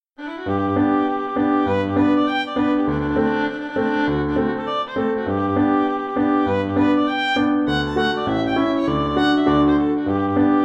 violin

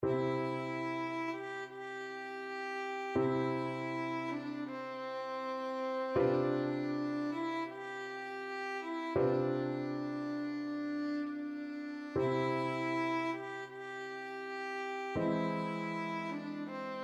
largo, violin

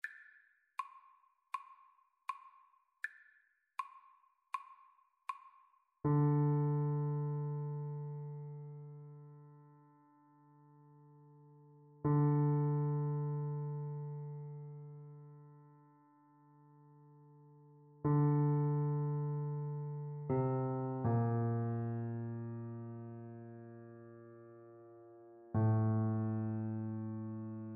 verbovaya doshchechka violin

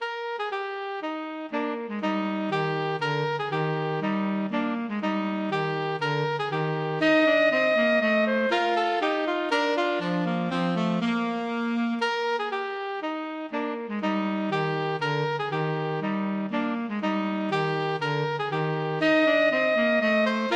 minuet, alto tenor sax duet

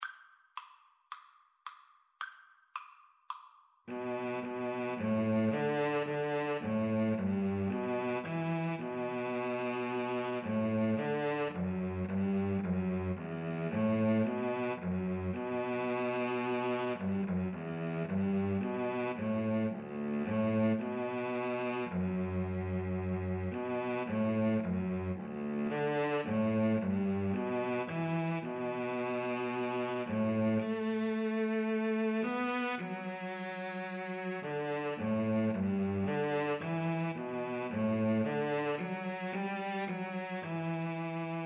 emmanuel, Viola Cello Duet

